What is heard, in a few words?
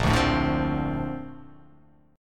A sharp Major 9th, down